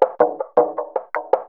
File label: PERCLOOP D+B 160, Drum+Bass Loop; eJay